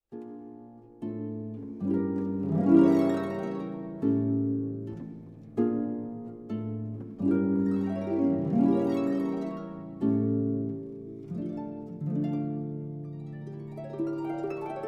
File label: harp; Schubert; Konzertfantasie Über Russische Volksmelodien